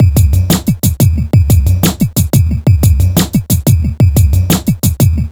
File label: bassline drum 090bpm; Zero-G; Downbeat Dance Grooves